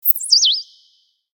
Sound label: voice bird; maoudamashii